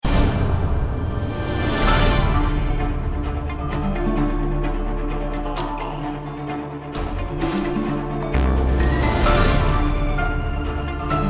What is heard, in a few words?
Suspensful Action